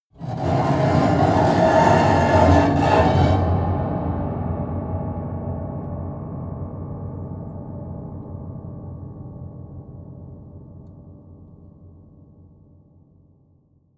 piano bow string broken low slow

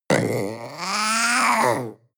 panther young vocal cry short

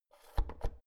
oven knob press out

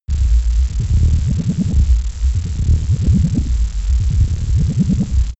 space alien machinery pumping

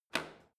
washing machine creaky button press in

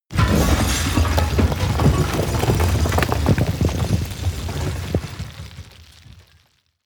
explosion large shrapnel

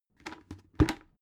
bucket lift up put down soft

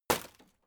impact sweetener wood shatter soft

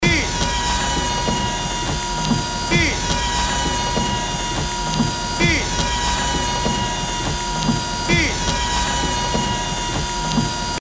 danger fx loop

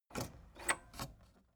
tape deck open

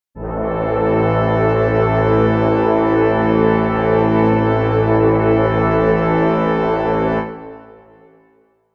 brass chord a min

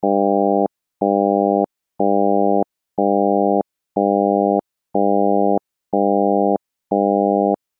alarm

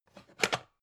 pan stack

